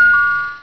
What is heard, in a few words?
bell